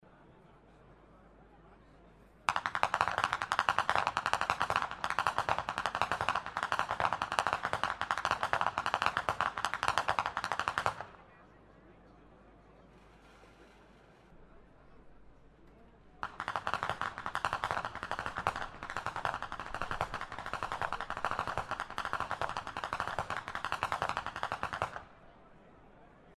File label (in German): schnalzen